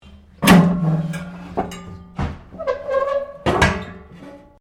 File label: Train Carriages 1937 Folding Basin Open; Bellarine Tourist railway vehicles; Trains